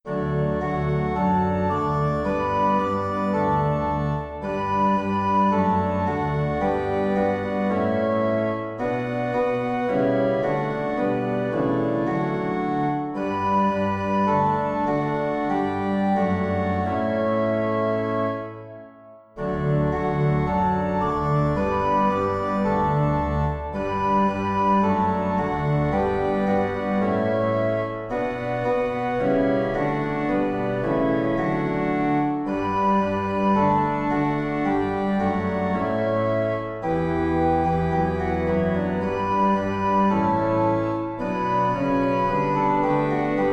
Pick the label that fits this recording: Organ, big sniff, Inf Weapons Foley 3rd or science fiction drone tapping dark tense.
Organ